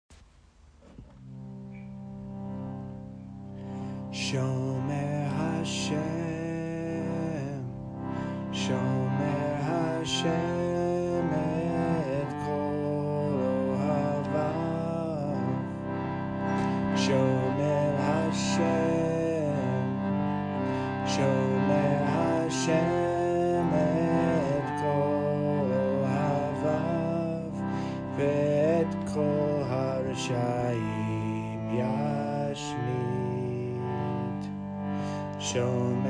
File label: chant; shin